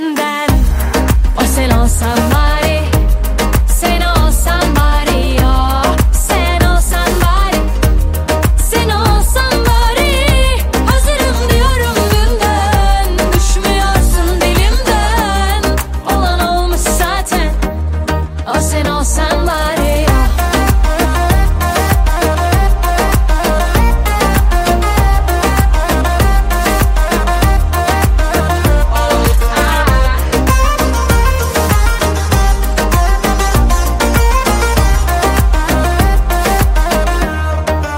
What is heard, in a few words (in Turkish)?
Zil Sesi